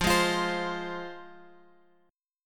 F Minor, F; Fm Chord {X 8 6 X 6 8} strummed

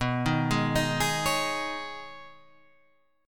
B 6th Add 9th B, chord; arpeggiated

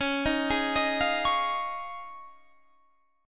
F Minor 7th Sharp 5th, Db

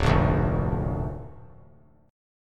D Minor Sharp 5th D down; Dm#5 strummed; JGuitar

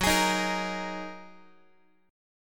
F sharp 6th Add 9th F sharp Guitar; strummed; JGuitar

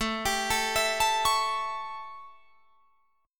A 7th A, Guitar Drop D

